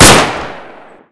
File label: Colt 1911 Fire, pistol, gun